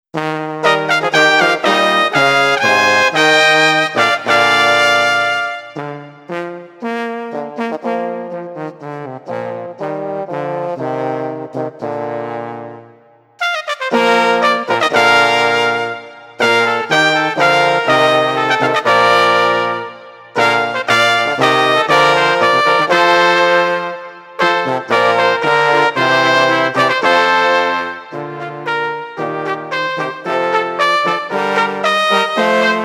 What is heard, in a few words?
Brass; XPression; Dowland